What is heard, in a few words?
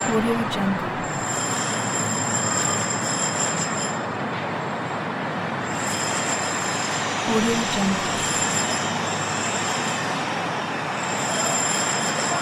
construction ambience